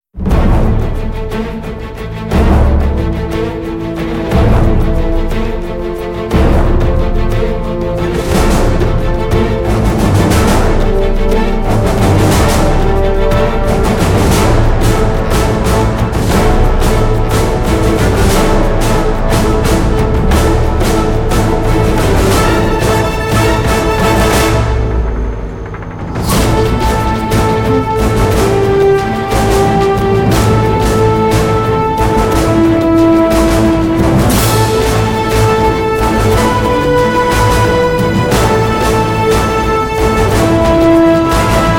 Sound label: GORILLA COMBAT CAVE